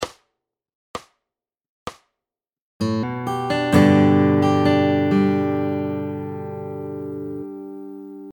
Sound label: Fingerstyle Chord Anchors